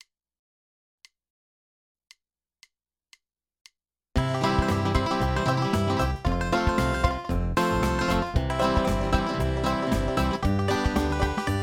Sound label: in Key of C